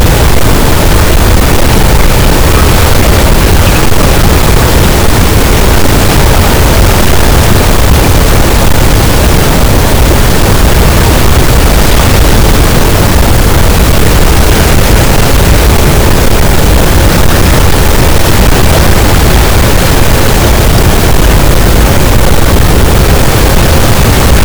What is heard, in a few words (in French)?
cachalot, gaussian noise; Physeter Macrocephalus